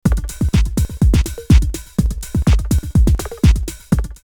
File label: ChipChop 124, drumloops Rave; X-Static Goldmine; 90 s Sample CDs